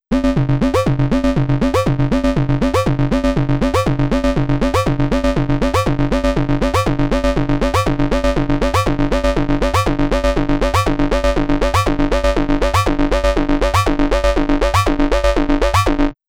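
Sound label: ring modulator, minor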